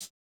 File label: Modern hihat, Electro